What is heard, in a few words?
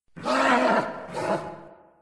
pain grunt